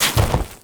Deployable Cover Destroy; Gameplay; Warframe; Tau; Destructible Dynamic

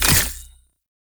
Vendor Conquest Modifier Select; Entrati

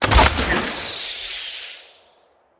Bow Ranged Shot Arrow Rain; Weapons; Envoy Hunter Bow

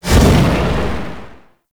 Void Dragon Firebreath Stop; Enemies; Abilities; Warframe; Duviri

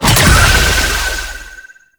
Balloon Mine Cast; Warframe; Follie Frame; Abilities

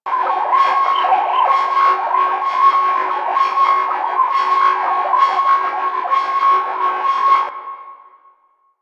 Ramsled Engine Loop; Warframe; Vehicles; RailJack Enemy Ships; Corpus; Corpus Ramsled Agent

